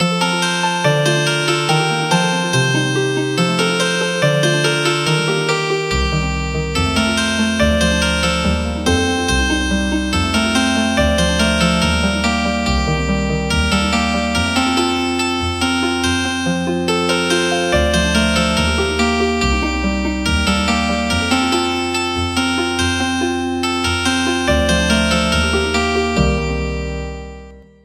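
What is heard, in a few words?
Healing Harp; Lorig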